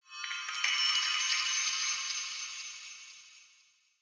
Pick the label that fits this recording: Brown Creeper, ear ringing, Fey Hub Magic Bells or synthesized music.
Fey Hub Magic Bells